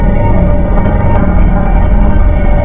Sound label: death ray loop; wizardwars; spells